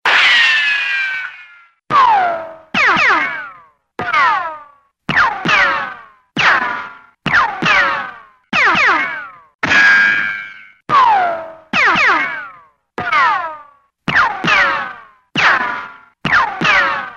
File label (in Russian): pul s otskokami i rikoshetami